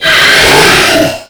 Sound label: Attack Scream; Warframe; Stalker